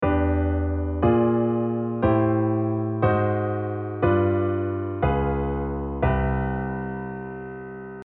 ura chord

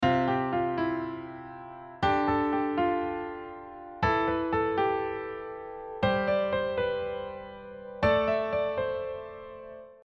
sus2 sus4

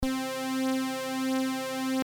Jump Brs lfo

